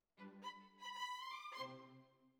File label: Violins Over